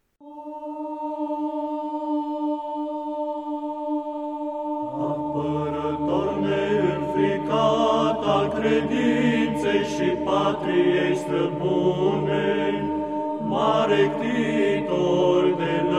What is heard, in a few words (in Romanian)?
Troparul Binecredinciosului Voievod Stefan cel Mare si Sfant